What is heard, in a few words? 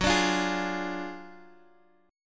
D Diminished F sharp